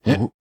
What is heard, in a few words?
Spa Bugs Startle